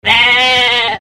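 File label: sound of ram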